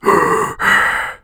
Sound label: GASP; DN3D; Duke Nukem 3D